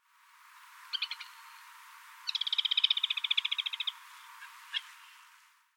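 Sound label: crested kingfisher, gifu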